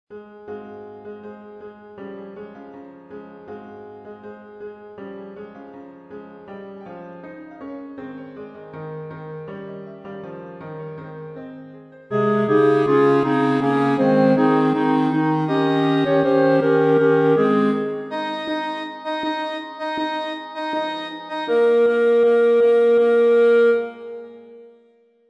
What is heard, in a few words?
Act I Finale Sixth Section 3rd Chorus Entrance Tutti; grandduke; Cue 3: "Henceforth our Court costume"